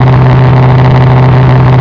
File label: sub engine; depths; ambience